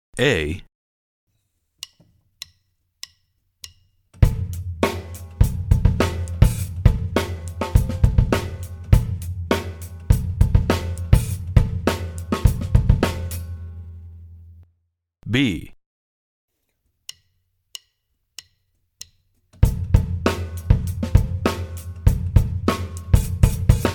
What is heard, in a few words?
Funk Beats